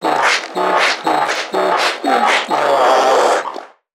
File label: Creatures Vocalisations Infected